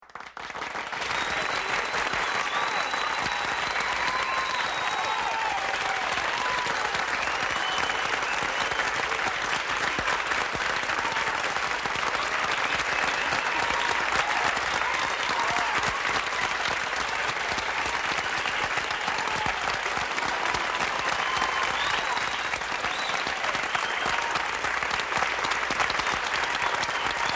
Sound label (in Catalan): aplaudiments